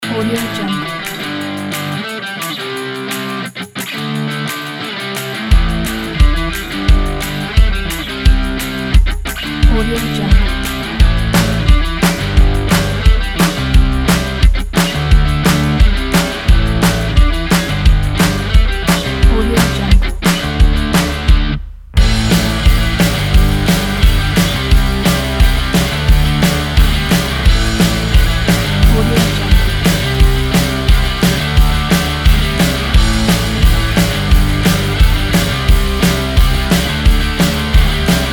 rock upbeat energy

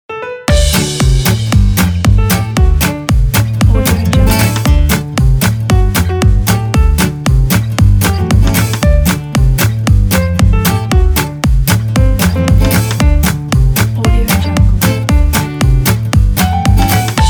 swing

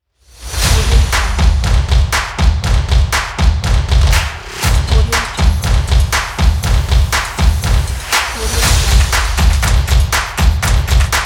drum logo

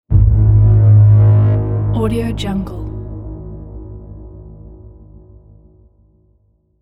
deep impact

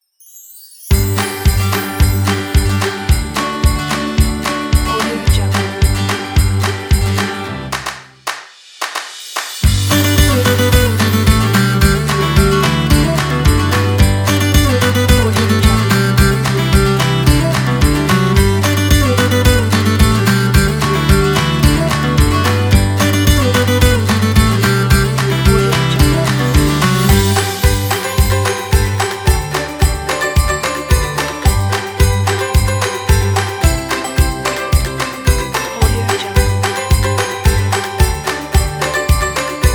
a christmas

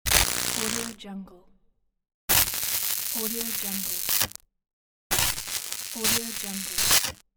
electricity

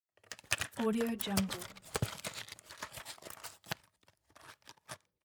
tablet blister putting into package; mountainaudio

